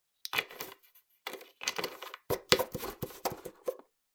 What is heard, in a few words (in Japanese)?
ペットボトルのキャップを外す音